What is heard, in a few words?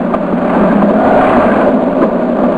start tank; M4 7.5.2; M4™ (PowerMac)